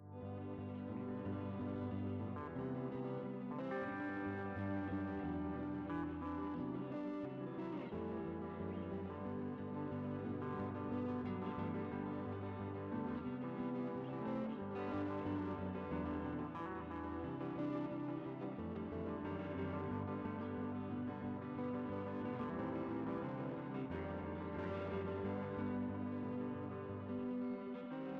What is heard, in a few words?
guitar orchestra